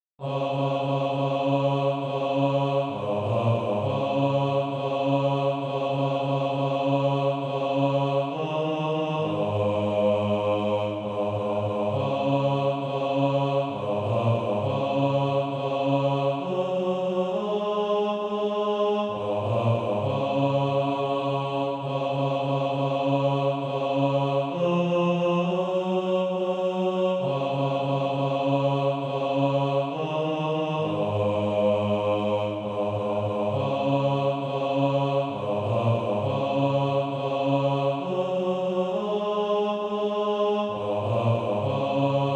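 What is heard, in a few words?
bass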